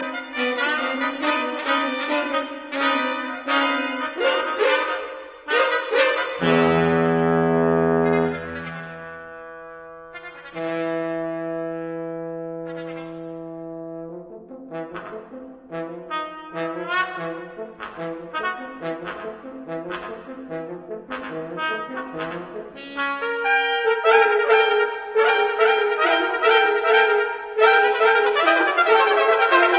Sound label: quintet excerpt; Goldenthal